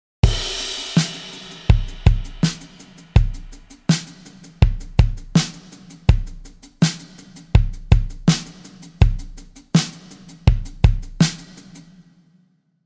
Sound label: Drum